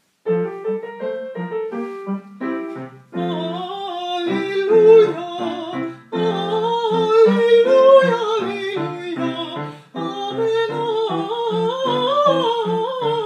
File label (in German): Halleluja; Haendel; HWV 273